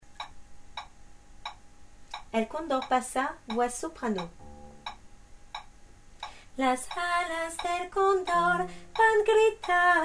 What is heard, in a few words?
soprano